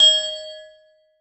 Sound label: glockenspiel d#3; Percussion; Sonatina Symphonic Orchestra